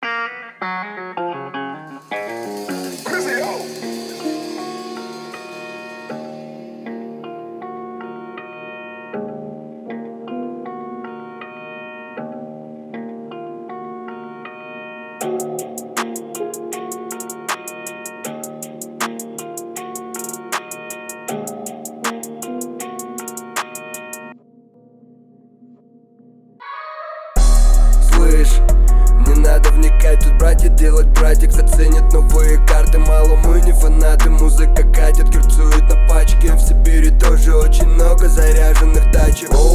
37.39.41hz Low bass

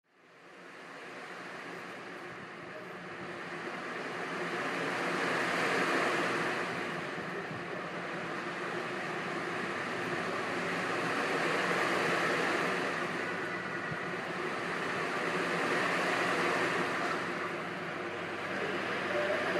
White Noise Flood; Nature Sounds Nature Music

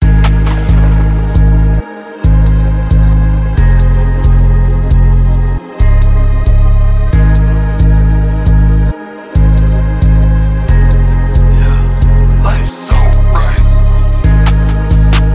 135 bpm G dur

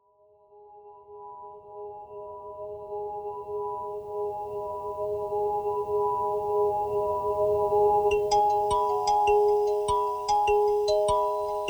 Kalimba Version

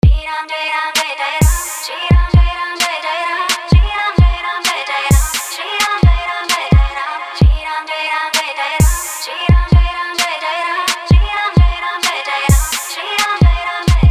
Trap Music

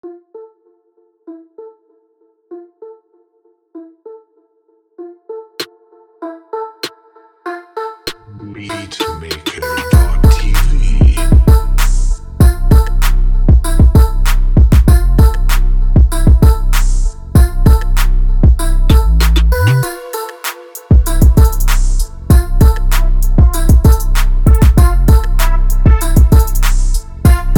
Instrumental beat

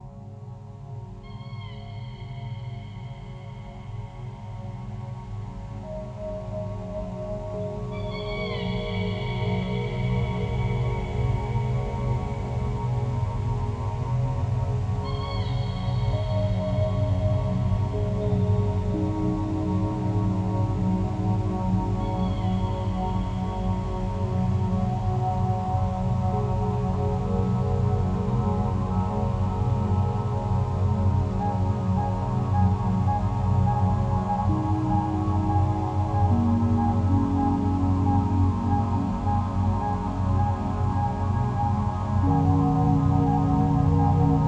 Alpha Brain Waves Meditative Music, Trance

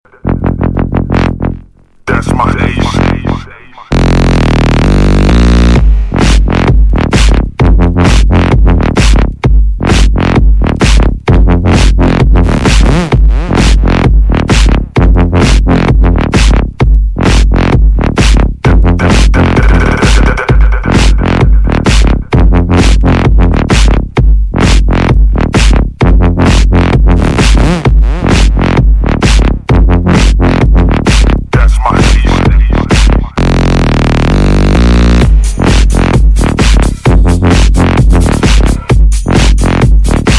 30Hz Breaks

